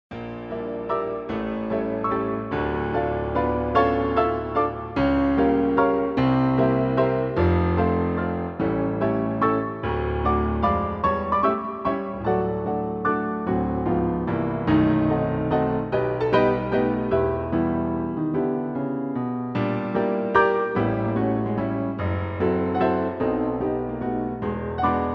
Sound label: piano roll